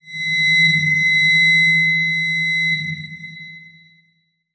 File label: Transition Bell Warble; Adobe; Production Element